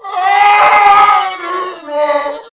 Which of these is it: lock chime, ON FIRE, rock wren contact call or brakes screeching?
ON FIRE